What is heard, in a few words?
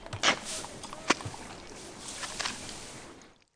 brick; PHARAOH